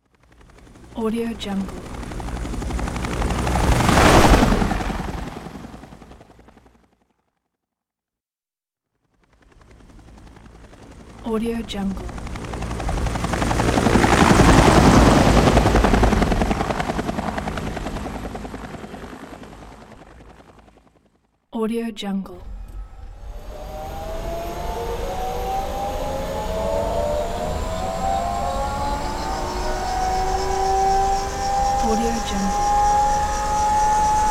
huey helicopter

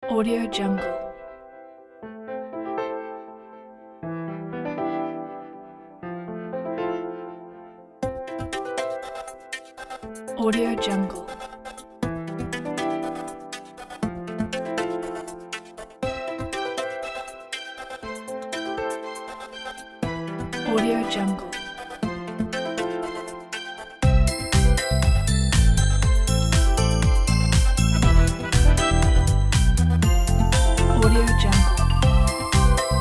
positive background

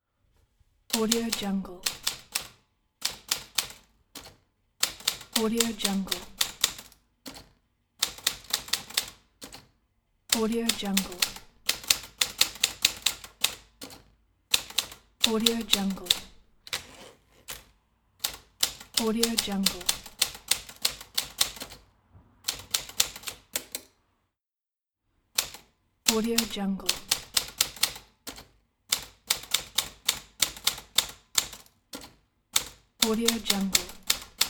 typewriter sound; audio mod

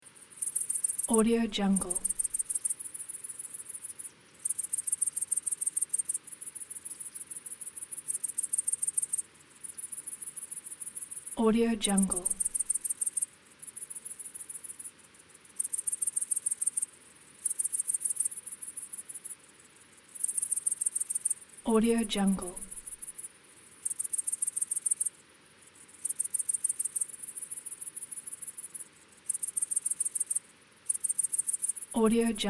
crickets

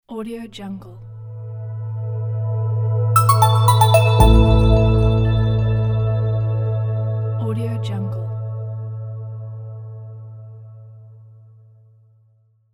minimal pluck logo

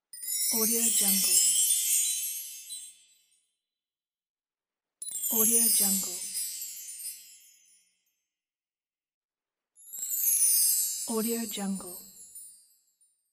magical sprinkle chimes

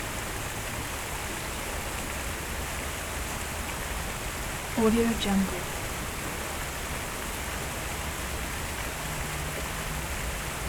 city park fountain; west-wolf

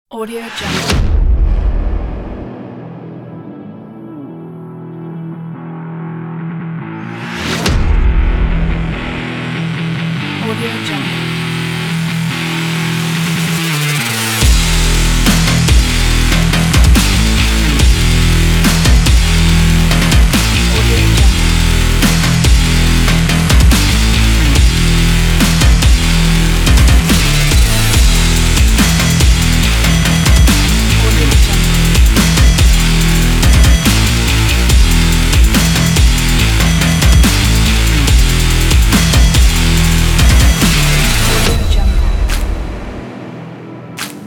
powerful dubstep rock

